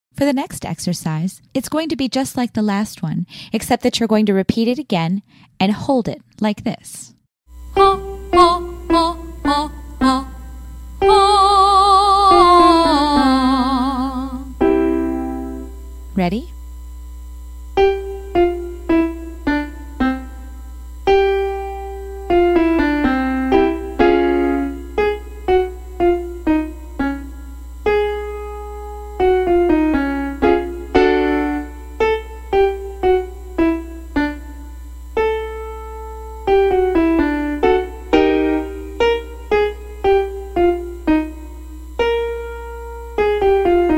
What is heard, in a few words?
Vowel Slurs